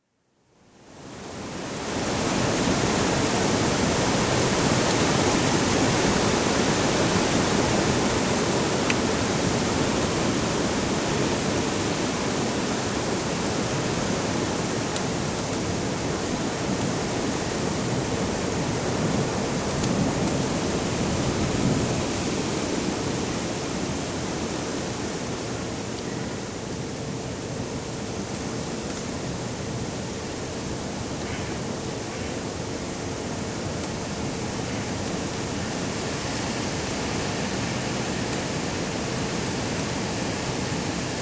Welsh Hillside with occasional creaking trees, birds & distant sheepdog; Outdoor Sound Effects; BBC